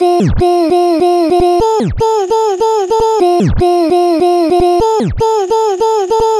Vocal Chop Loop 150 F; Vocal Loops; Ghosthack Advent Calendar 2018 Day 9

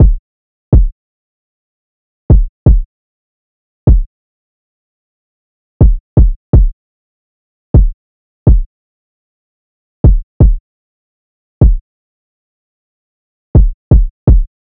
Drum Loop 124 BPM Kick; Cymatics; Vibes II Drum Loop Collection; Aftermath

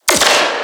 Bass Hit D#, Dubstep; Twilight Bass; Ghosthack; AC2024 - Day 13 - Dubstep Sounds 2025

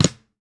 Snare Lo-Fi

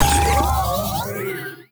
wooshes passby mech; Whooshes

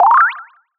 Retro FX; Cymatics; Video Game Sample Pack Vol 1

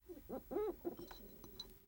Ceramic Plate Rubs; Fairlight Sound Lib

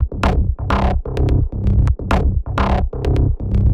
Progressive Beach Bassline C 128 BPM; Slash

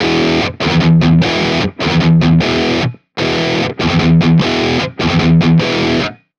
Metal Guitar Loop, 150 BPM E Maj; Cymatics; Vibrations Guitar Sample Pack

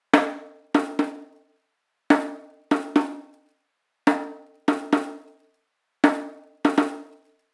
Djembe Loop 122, Afrohouse; Afro Drums; Kits; Ghosthack; Drum Mega Bundle

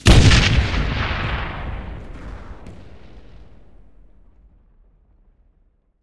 explo grenade; Explosions; PremiumBeat

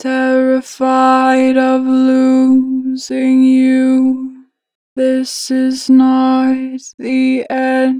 Losing, Harmor Vocals; 4 bar flat; Pitch Flat

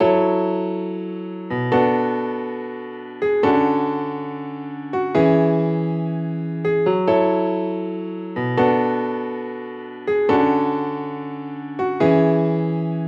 Future Bass Piano Loop 140 BPM F# Min; Instruments; Piano Loops; Cymatics; EDM Starter Pack

